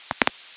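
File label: neck crack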